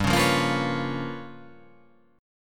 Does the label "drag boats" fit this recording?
No